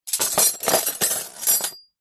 household cutlery metal handful place down into plastic cutlery tray